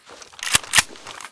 spas12 misfire

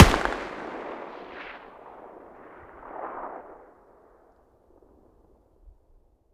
mg muzzle mono far, weapons stationar

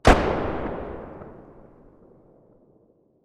muzzle mono far, weapons

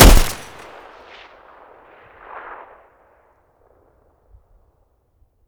mg muzzle mono, weapons stationar

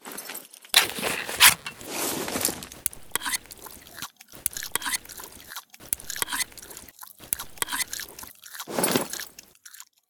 conserva use; STALKER; IWP; item usage

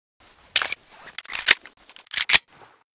glock18 reload empty

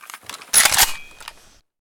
ak74 misfire, weapons; STALKER; IWP

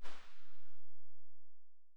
sa58 silencer very far, weapons; STALKER; IWP

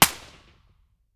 sa80 silencer mono distant, weapons

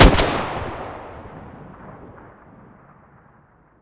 groza muzzle stereo, weapons

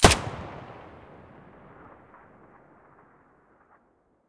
mp5 muzzle stereo, weapons

